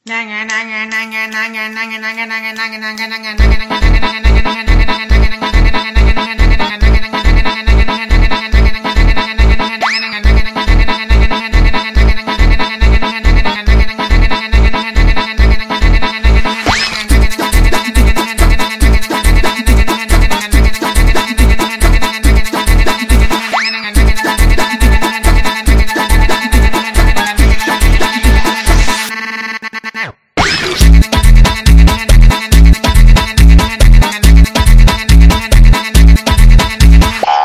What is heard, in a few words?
FUNK